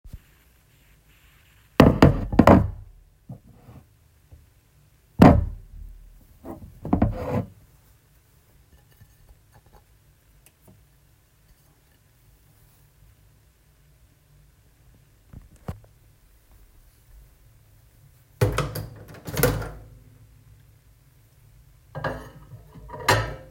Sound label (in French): Assiette; deambuler